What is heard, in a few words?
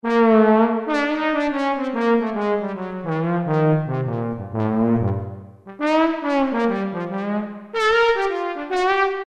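Trombone, Yamaha TG33; Factory Presets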